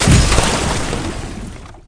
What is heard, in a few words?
Smash Ice; Kelly Slater Pro Surfer